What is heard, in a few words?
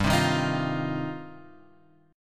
G 7th Suspended 4th Sharp 5th; G7sus4#5 Chord {3 3 1 X 4 1} strummed